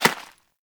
rodent hop step rear dirt, forest rodent footsteps; Foley; Warframe; Characters